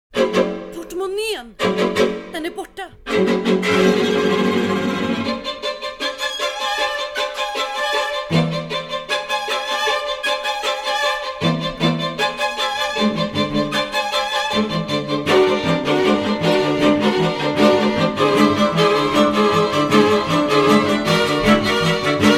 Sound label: coin purse music